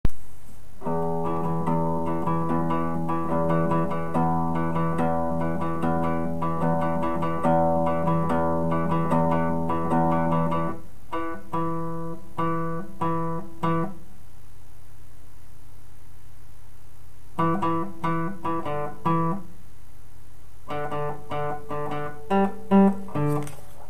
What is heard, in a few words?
kalimba